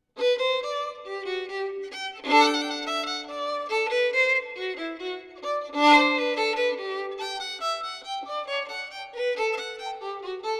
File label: bouree Solo; String Quartet; Bach